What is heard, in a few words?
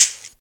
shaker hi hat g sharp key, shaker percussion tuned to the G# note. Loudest frequency: 6335Hz